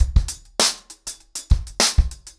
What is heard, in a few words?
100BPM, ACOUSTIC DRUMS